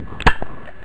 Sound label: Click; TykeWriter 1.2.0